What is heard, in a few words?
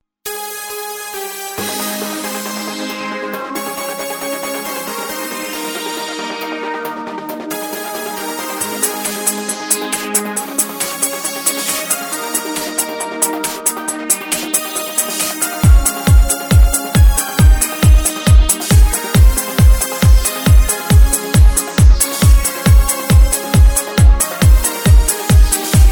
Korg Pa3X